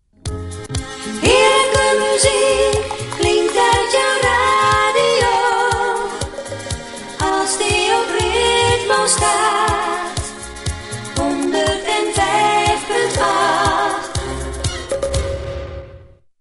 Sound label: jingle; Ritmo